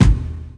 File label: rich mids kick drum g sharp key; Mono